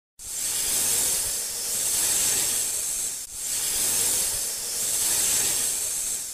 snake hissing sound effect

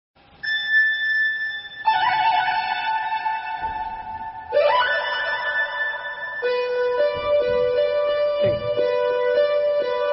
lazer keyboard

